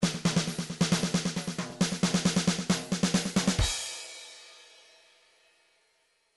trance midi loops fills; Newtronic Trance Constuction Kit; assend

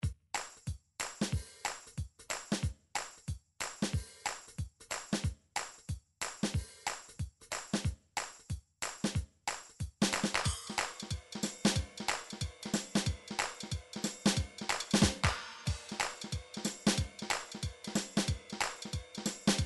drum foxtrot

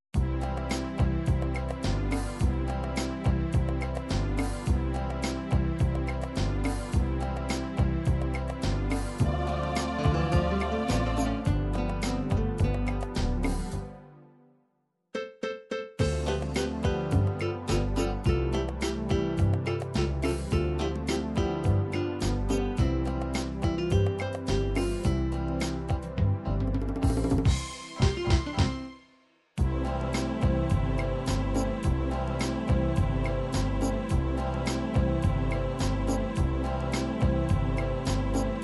ballad spanish

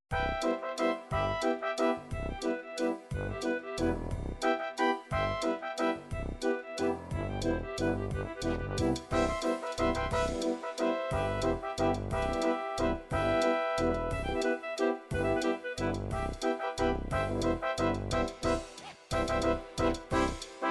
psr9000 waltz, midi

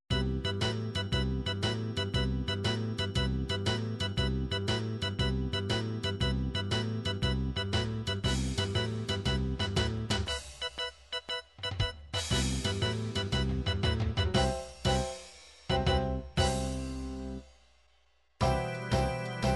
ballad surf shuffle, midi

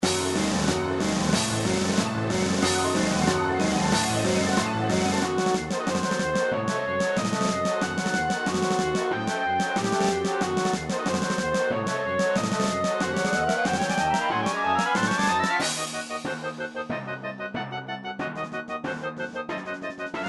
boss malevolent orchestral remix; nintendo mega man iv

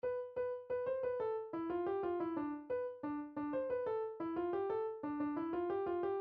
arabic song ring

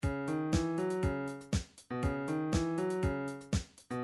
funk, midi

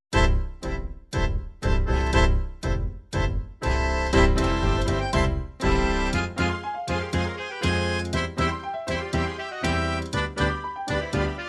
tango, midi

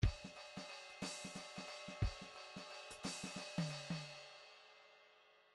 midi file fills 3 4 fill

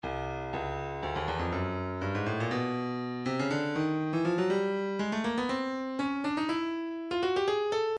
stylus breakbeat midi files 121 bpm; Studio 54 F

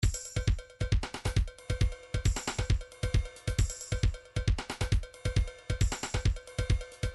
trance midi loops beats; newtronic trance constuction kit

